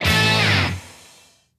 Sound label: Stinger; PnF Boys